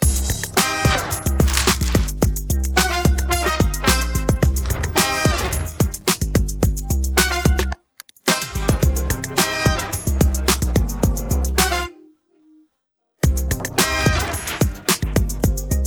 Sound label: mix no vocal; hofer cena 20s tvc